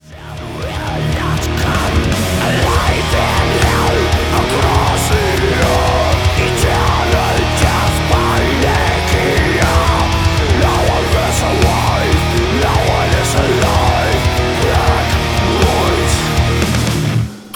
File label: METAL